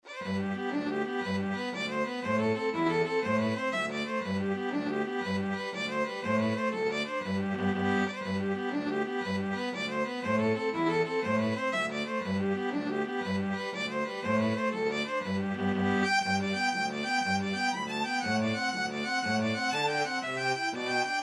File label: irish jig medley string trio